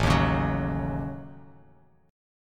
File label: A 6th Flat 5th, Bass Standard; A6b5; strummed; JGuitar